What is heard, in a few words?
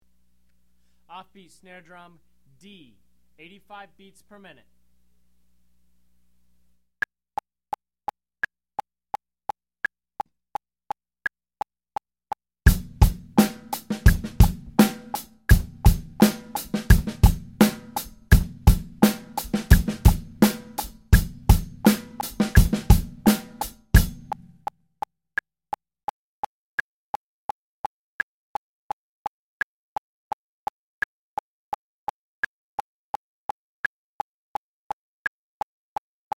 off beat snare drum; Drum Lessons